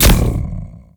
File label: imp dark sword